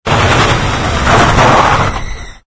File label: restart; Ultimate Soccer Manager 98